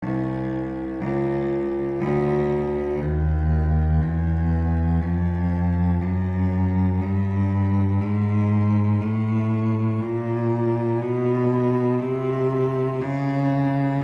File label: Chromatic Scale; Scales